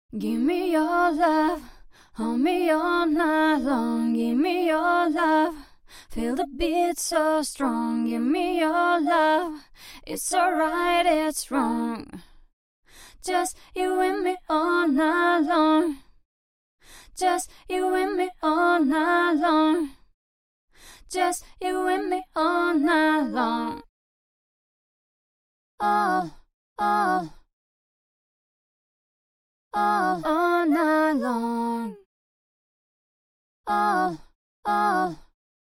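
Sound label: pop 122 E minor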